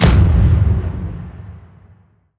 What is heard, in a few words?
Coilgun, Weapons